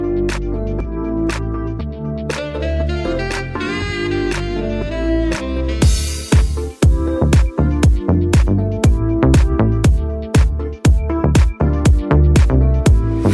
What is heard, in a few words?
Deep Sax